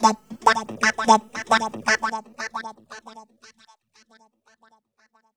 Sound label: BEAT FX, Funky Vocals; USB Soundscan vol.18; AKAI; TB BEAT